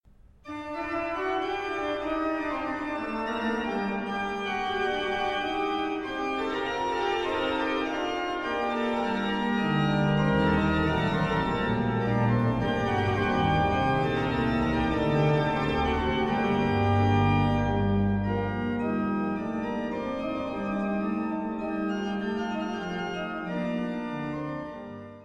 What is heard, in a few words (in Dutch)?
Orgelpartita; Variatie 2